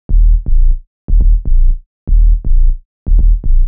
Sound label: Bass 121bpm Am Synth Sub